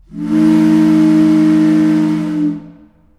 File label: 10 x 32 Steam Whistle